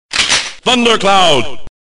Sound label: Thunder Cloud